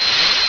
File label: Tractor nohit; FF 0.3.4 public beta; Arcade Games